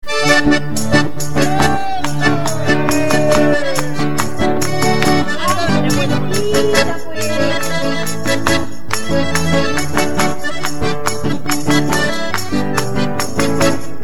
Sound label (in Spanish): Polka